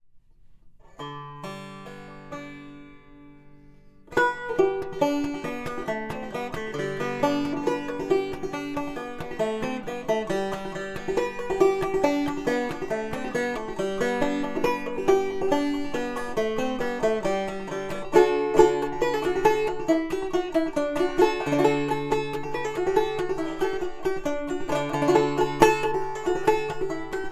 Moderate power. Balanced and very sweet., banjo, Grenadilla tone ring; 0.7" wall thickness; Soft maple rim; Recordings: M-Audio microphone and Fostex MR16 recording studio; Flop Eared Mule